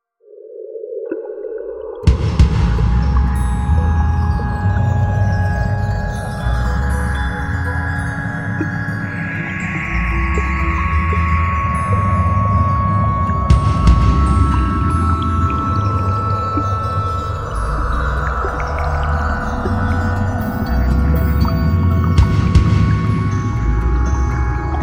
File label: Mysterious Atmosphere